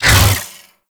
Hammer Shield Whoosh E; Crp Hammer Shield

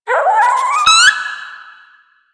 Rabbit Ambient Call; Duviri; Animals

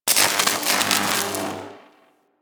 Spark; Warframe; Ambience; Corpus

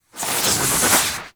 Melee Heavy Attack Small Charge; Warframe; Weapons; Whooshes

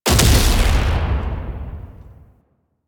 Turret Projectile Explosion; Warframe; Duviri; Duviri Turrets

